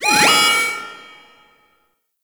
Security Camera Alarm; Warframe

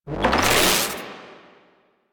Amp Reload Ready; Operators; Drifter

